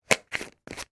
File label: household vitamin tablet container lid screw on